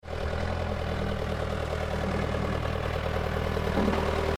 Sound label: excavator; vehicle